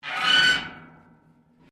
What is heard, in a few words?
METAL RUSTY SCRAPE; Metal Sound Effects; Metal (Sound Effects:Materials)